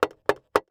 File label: Foley Toy Hammer Nail Plastic